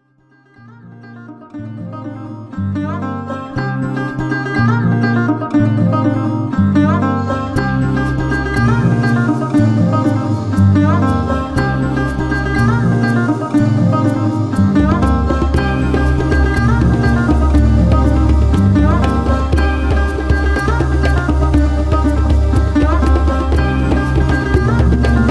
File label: indigenous groove